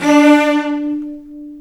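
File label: D3 arco long cello, left; East West; The Ultimate String Collection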